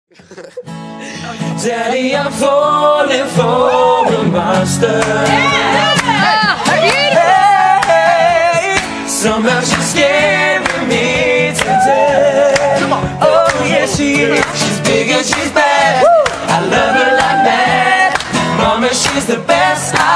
acoustic

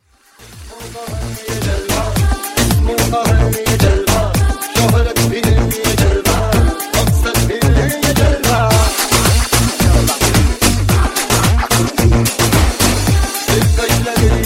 fashion rap mix

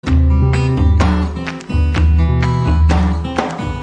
Neat Riff

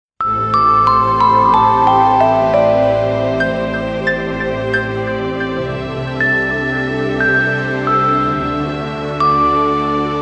Nokia Landscape

